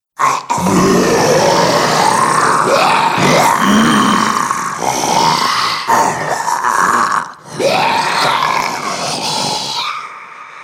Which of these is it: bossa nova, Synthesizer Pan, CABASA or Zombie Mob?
Zombie Mob